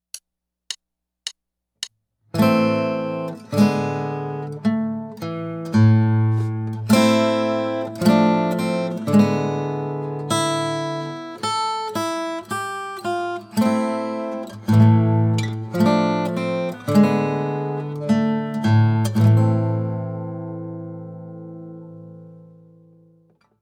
Minor Song

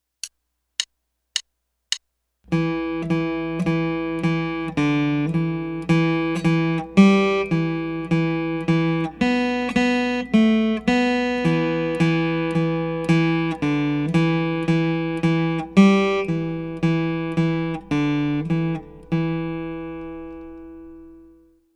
Native Drum

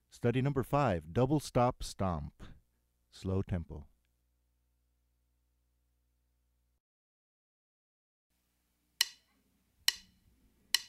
Double Stop Stomp Slow Tempo

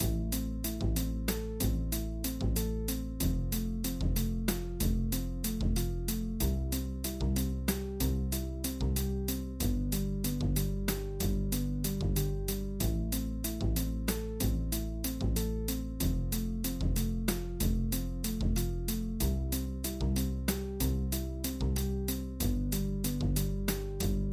3 vs. 2 backing

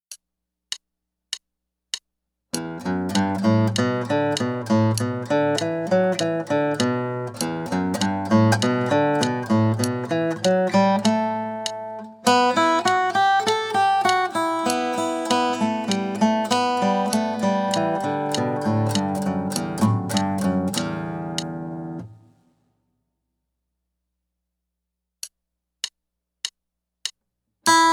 Picking Studies

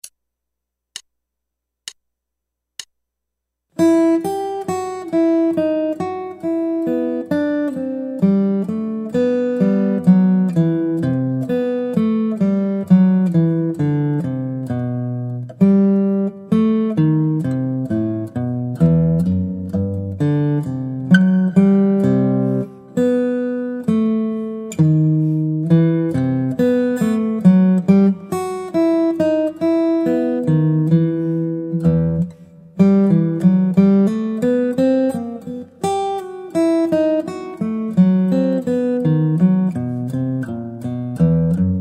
Guitar

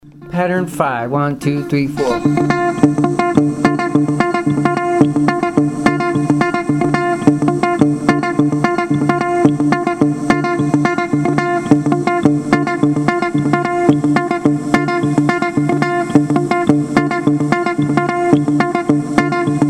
mozambique patterns

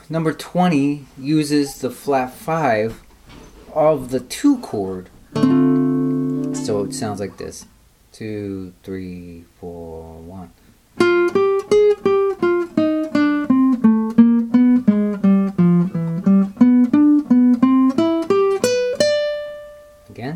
jazz licks

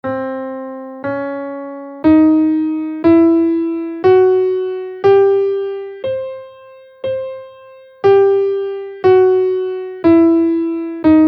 istrian scale in C

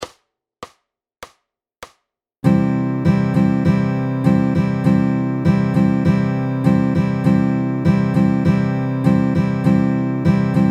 12 bar blues in C 100 bpm

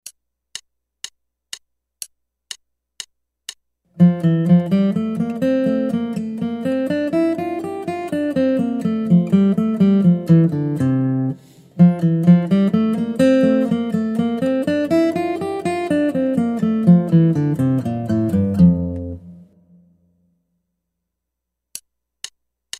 Guitar

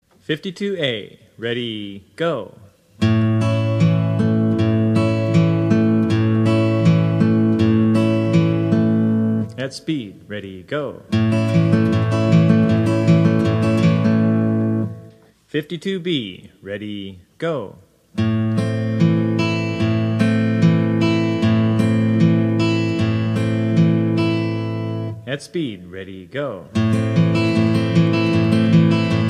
travis picking

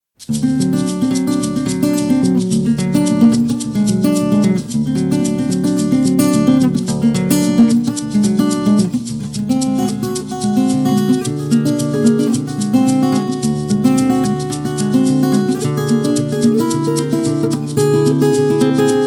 super locrian bb3

